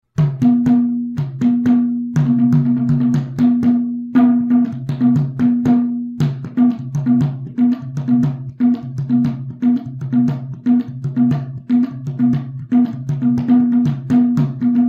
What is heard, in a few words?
madal